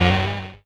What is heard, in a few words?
Lead, 8-Bit